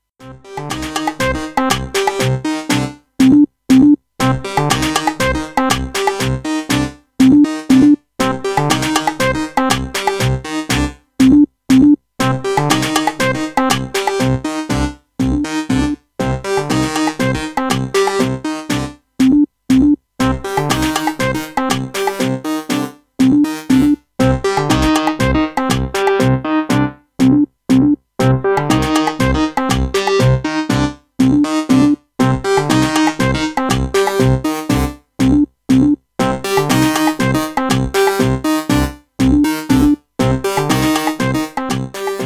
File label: meditation